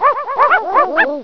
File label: Dogs; Computer Kids 1.2